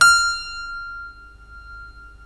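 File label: F5 clavinet left, Keyboards Acoustic; USB Soundscan vol.17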